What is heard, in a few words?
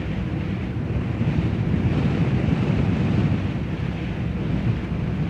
wind gusty, ambient